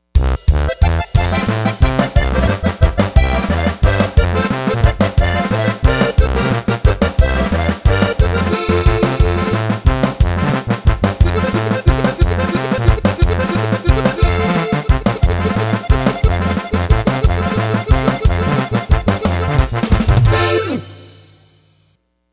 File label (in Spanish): Progresivo Banda